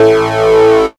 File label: HARD A2, dubstep; Jack Sparrow; Deep Dubstep Progressions